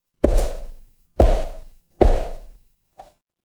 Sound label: footsteps concrete